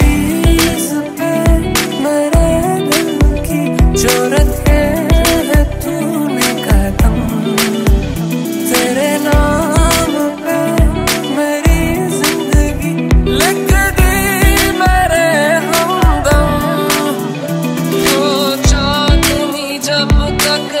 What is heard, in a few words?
sad instrumental bollywood ringtone